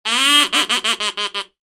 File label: duck call sound funny